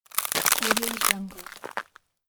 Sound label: ice crystal crunch